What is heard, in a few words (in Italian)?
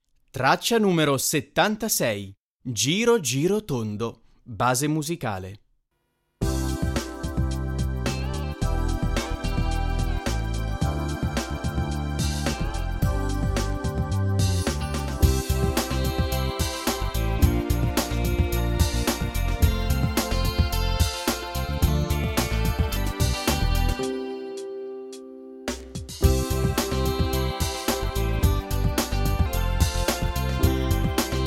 base musicale; infanzia